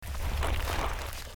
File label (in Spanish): excava